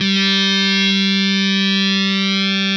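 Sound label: fuzz, fuzz guitar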